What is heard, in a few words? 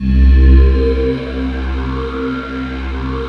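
space voice C2; SPACEVOX; Synth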